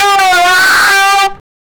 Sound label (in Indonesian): monster berteriak dengan